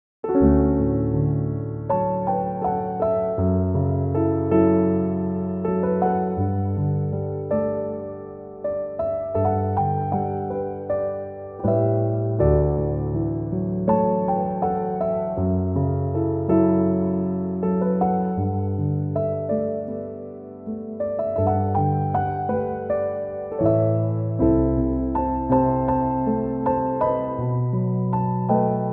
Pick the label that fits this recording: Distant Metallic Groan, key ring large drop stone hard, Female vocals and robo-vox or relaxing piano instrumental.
relaxing piano instrumental